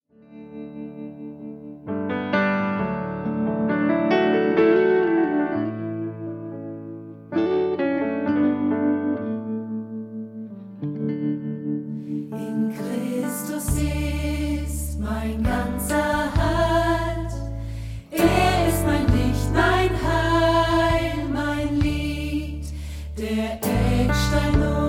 StudioChor; Childrens Corner StudioChor

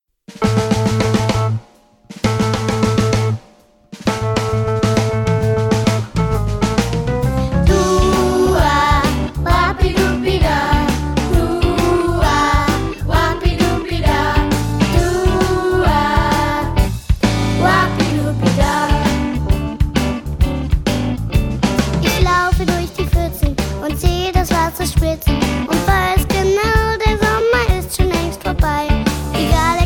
Regenwetterblues

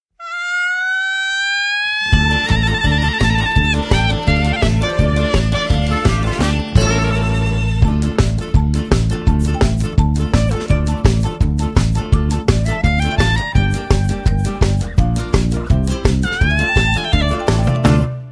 Marktlied Playback

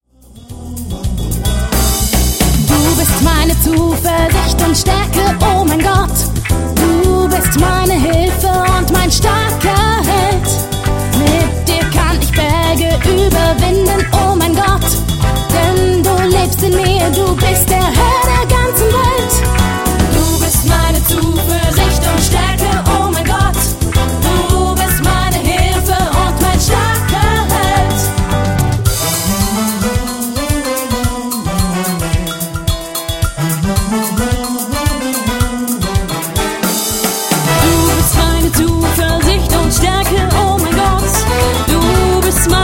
Zuversicht Samba

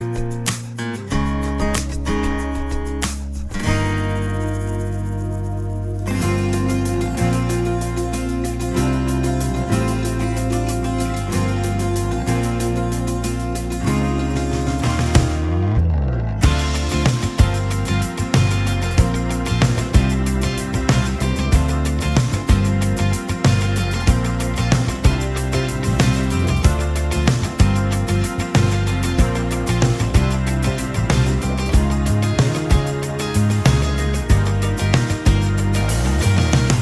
Playback ohne Backings

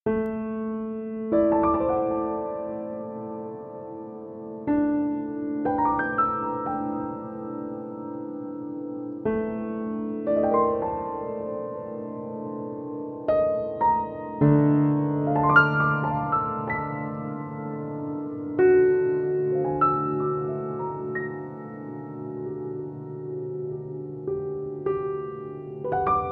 Klavierrhythmus

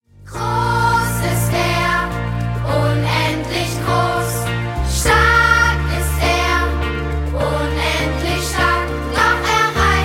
Mit Trompeten und Posaunen

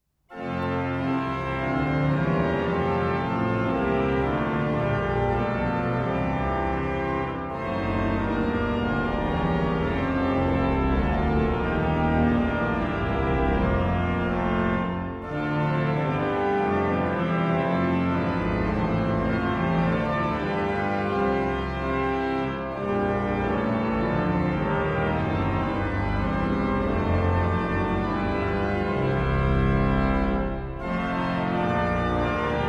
Orgel

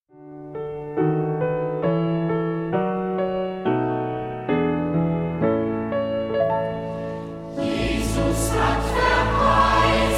Chor

